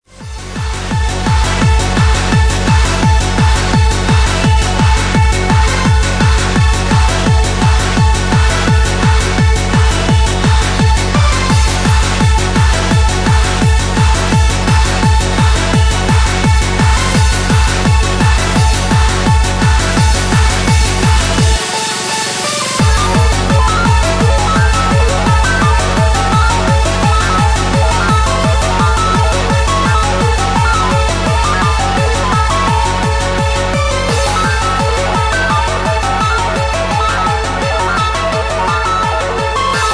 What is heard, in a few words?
Hardcore